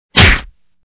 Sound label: punch